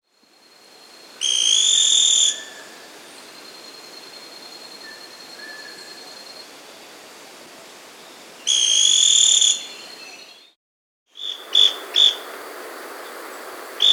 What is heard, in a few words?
Scale-Crested Pygmy-Tyrant; S. America